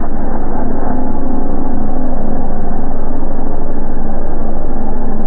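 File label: inside lighthouse ambience; hl2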